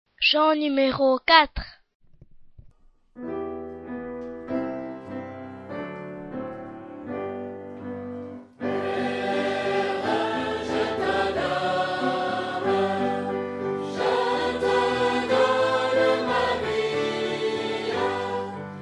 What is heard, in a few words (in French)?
Chant; FR